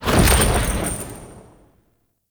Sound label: Ghost Teleport In; Warframe; PriestQuest; RellGhost